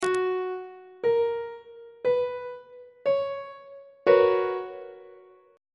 c flat major 7th suspended 2nd 2nd inversion on treble clef